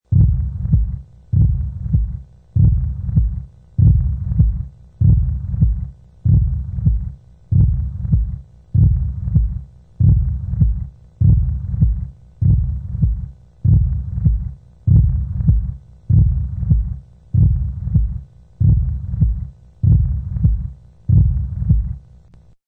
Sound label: Steady heartbeat.; Heartbeats; Human